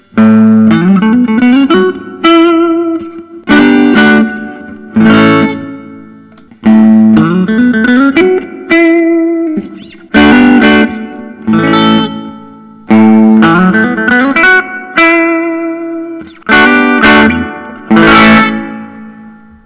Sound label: gibson custom aged 63 firebird, guitars electric solid body; tobacco burst; Offered here is Gibson Johnny Winter Firebird, number 74 of the 125 made between 2008 and